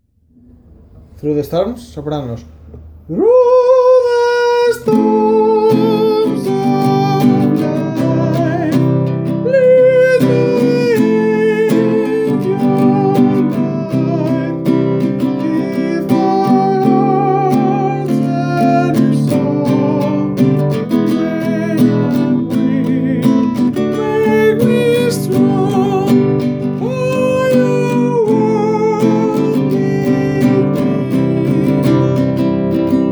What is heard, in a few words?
Sopranos